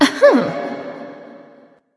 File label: heh heh light; smite; voicepack; hel